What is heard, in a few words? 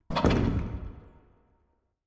alarm garrison; interface